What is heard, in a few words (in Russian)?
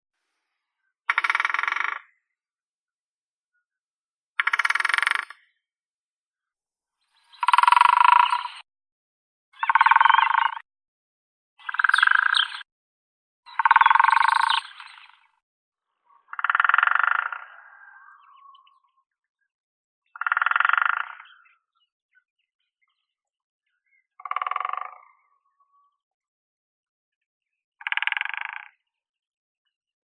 dyatel siriiskii dendrocopos syriacus